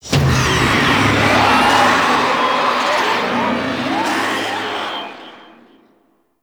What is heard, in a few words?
Zoo Wave Pain Stinger; Warframe; NineteenNinetyNine; Stage10TheZoo